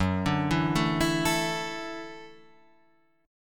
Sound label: F sharp Minor 7th Sharp 5th F sharp, Guitar Standard; arpeggiated; JGuitar